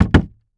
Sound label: Foley Footstep Golf Cleats Jump On Wood Platform Slow; Foley Footsteps